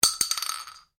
Cartridge shotgun 12 gauge impact ceramic tiles, shell casings; shell casings of different calibers impacting on different surfaces